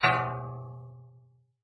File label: Ting; Ki11er Clutter